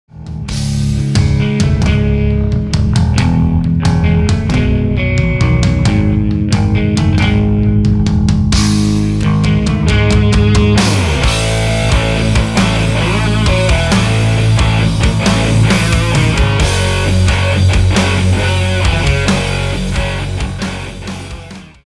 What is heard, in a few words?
Instrumental